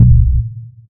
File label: Muffled Kick